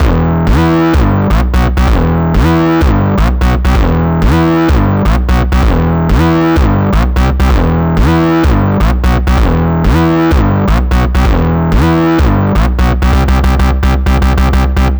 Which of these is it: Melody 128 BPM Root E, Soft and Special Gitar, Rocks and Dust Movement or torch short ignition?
Melody 128 BPM Root E